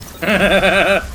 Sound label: Laugh